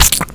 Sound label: splat